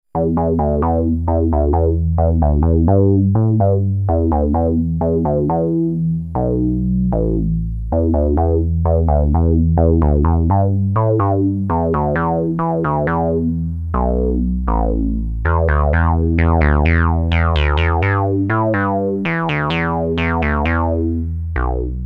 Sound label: bass